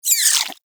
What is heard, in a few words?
Vocal Pain, Hunting Critters; Nexifera